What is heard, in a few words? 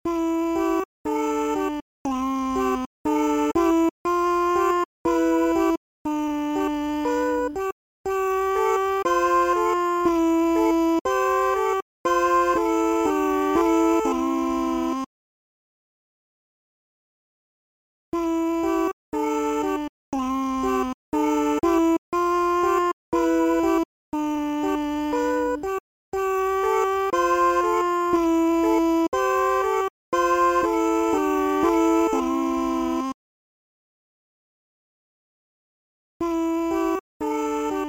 Vox; Mogwai Song 1; Gremlins Gizmo; wii